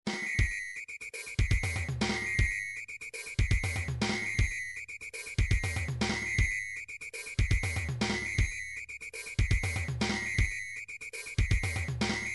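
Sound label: drums pattern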